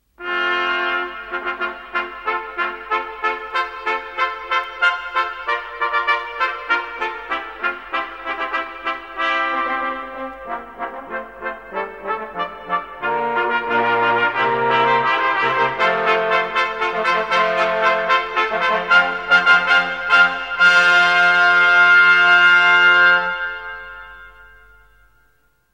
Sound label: fanfare